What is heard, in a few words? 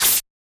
snake attack